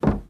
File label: Glass footstep